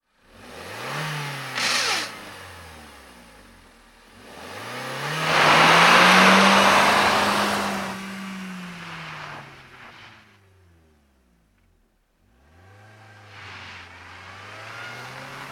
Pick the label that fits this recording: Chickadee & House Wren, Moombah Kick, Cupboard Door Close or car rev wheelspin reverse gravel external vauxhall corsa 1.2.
car rev wheelspin reverse gravel external vauxhall corsa 1.2